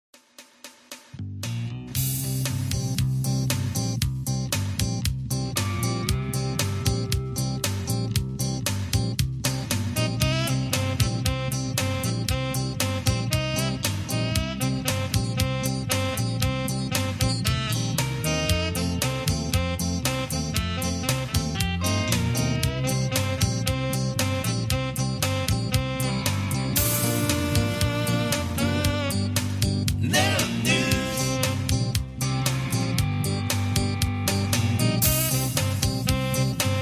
Jive Instrumental

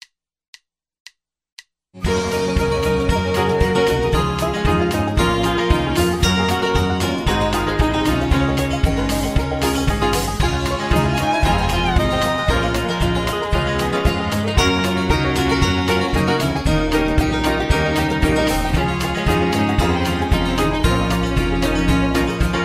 Low Key

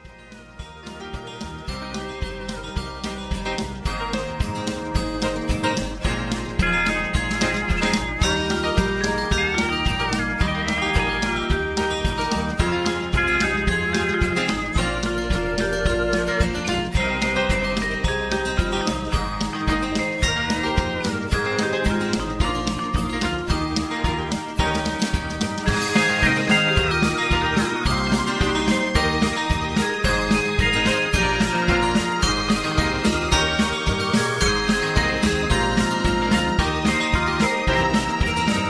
Country Christmas High Key

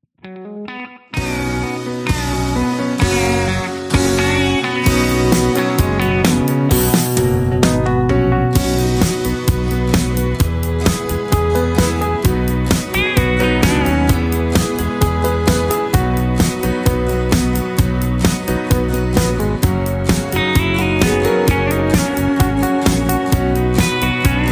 Instrumental with Leads

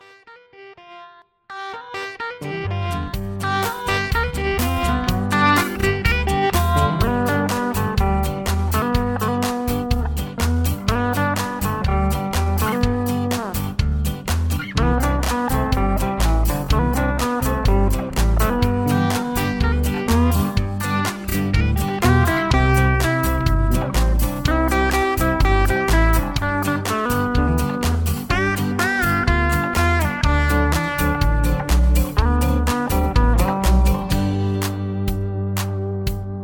High Key

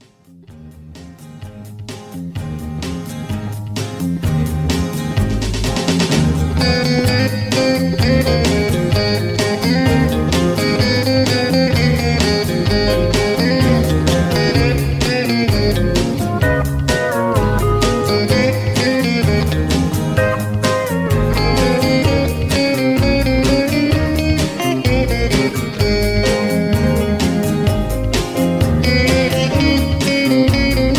Boogie High Key

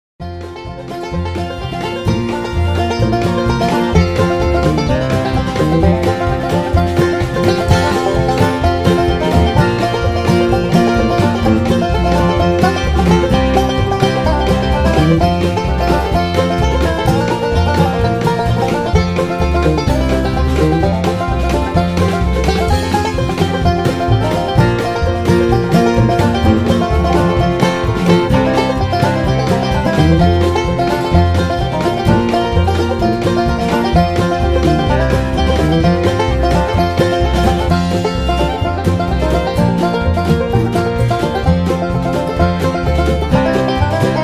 Instrumental No BGVs

